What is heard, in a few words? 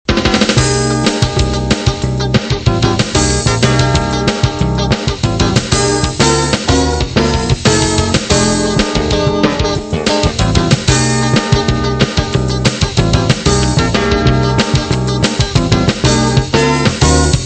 Ska Ska Ska